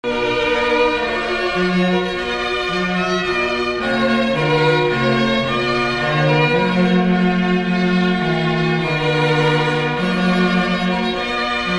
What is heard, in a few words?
trumpet voluntary